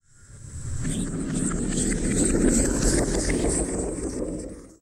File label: sfx sci fi; Masterbits - Switch FX